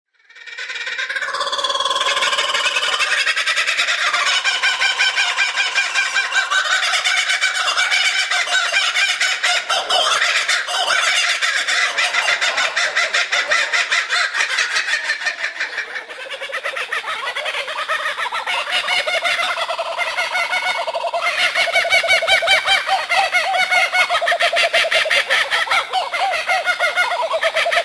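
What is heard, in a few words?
kookaburra, bird voices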